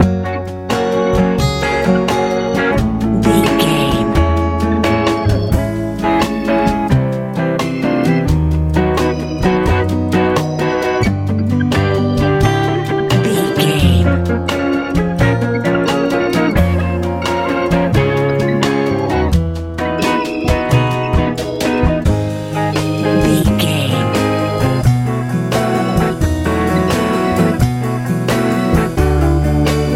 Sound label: 50s pop